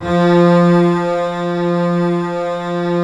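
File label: C BASS F3 left; Greytsounds VOL-1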